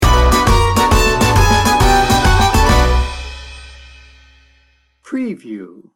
tarantella frenzy sting bumper

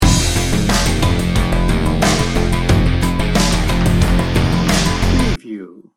ominous doom loop

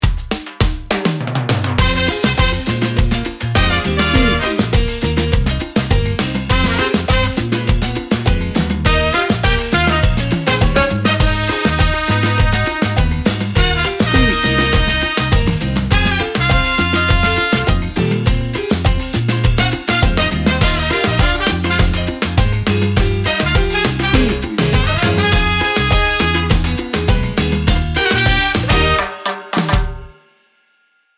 salsa fire

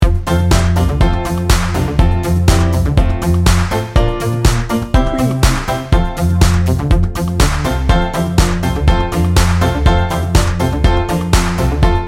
joy to the world loop

